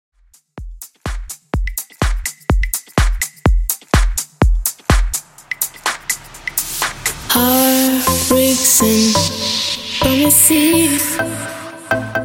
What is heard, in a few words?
Club House 125 Bpm